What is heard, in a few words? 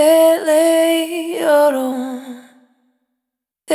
Vapor, Single Voice Melodic Loops